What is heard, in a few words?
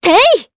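Vox Attack Japanese; Leo; kingsraid; voices heroes jp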